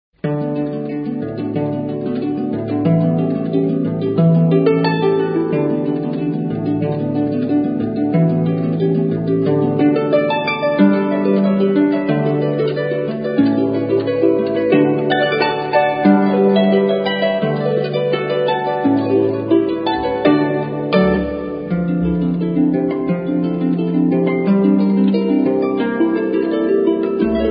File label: rhumba